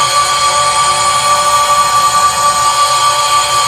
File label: battlecry loop; LoL; xinzhao